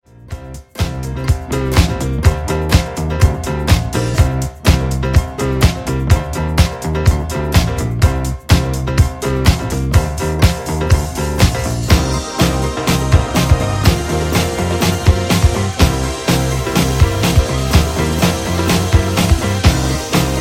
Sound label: instrumental